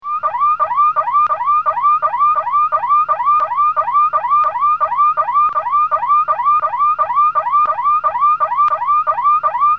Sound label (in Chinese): 急救, 告警; 广播室铃声系统